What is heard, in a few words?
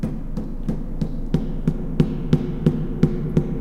FOOT